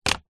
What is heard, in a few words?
industrial tape measure plastic down on wood surface; zapsplat